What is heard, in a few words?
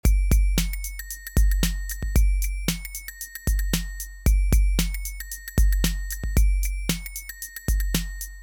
Loop